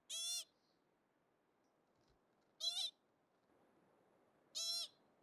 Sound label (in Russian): pishuha, zvuk pishuhi mlekopitayushie